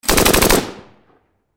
Gun Machine Gun, 7.62 Caliber Bren Lmg Short Burst Close; Machine Gun Automatic; Close Perspective; Warfare; Blastwave